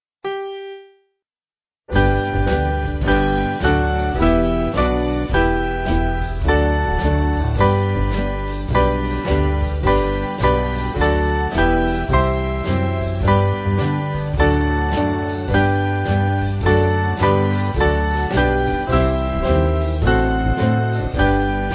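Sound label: Band, Free Music Backing Files for Churches; The Doxology; Old 100th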